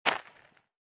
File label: Snow hit; iStunt 2